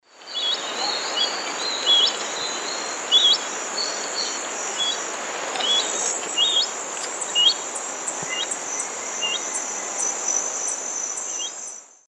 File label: Neochen jubata, flight calls; S. America; Eastern Lowlands